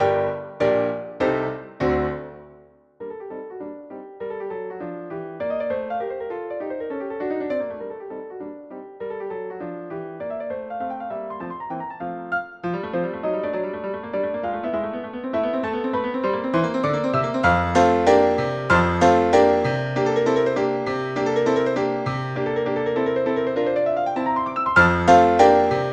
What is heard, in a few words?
Danse a la tarentelle for piano; synth